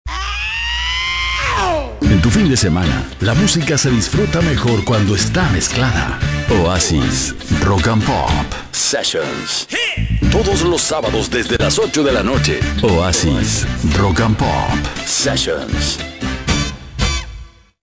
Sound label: intro rock pop sessions; radios del peru lima regiones y provincias